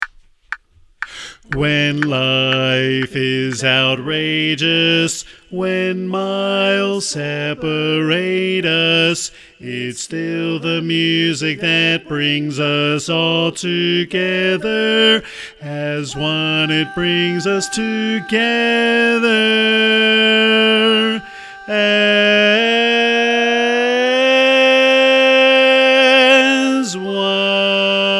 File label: Bass